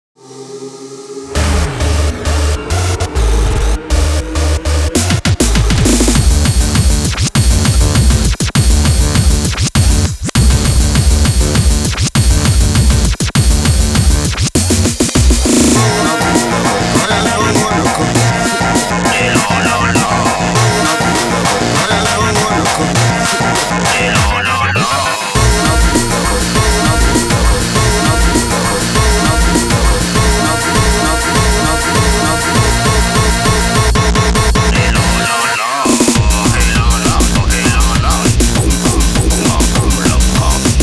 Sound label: Groove; superbeat xonic